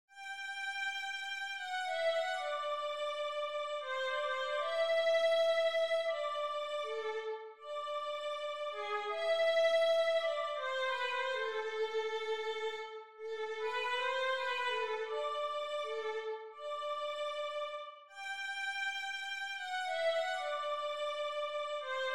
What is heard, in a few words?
nocturne violin